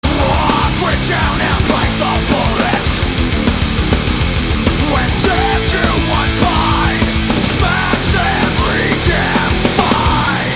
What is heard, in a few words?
win, music